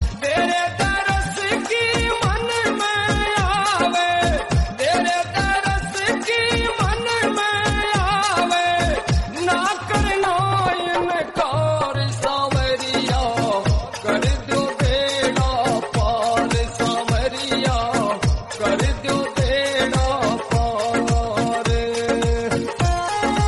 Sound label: Khatu Shyam Bhajan Ringtone